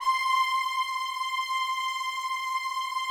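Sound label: PAD, atmospheric pads; Best Service; XX Large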